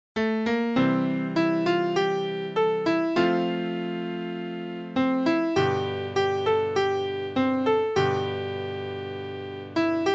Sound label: Piano